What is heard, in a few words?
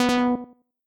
false, system